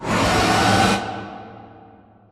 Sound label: Assembly Plate Lift Up Rock Close; Boomtown; Titanfall 2